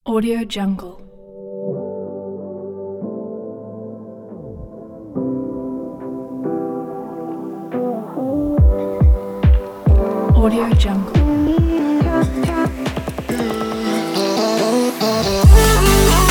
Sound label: future chill